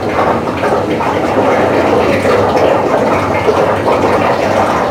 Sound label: Water Through Pipe Large; Ambience; Warframe; Grineer Galleon